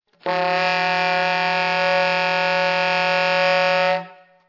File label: multi, sax